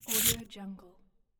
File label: scifi hitech interface sound